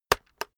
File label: keyboard external enter hit slow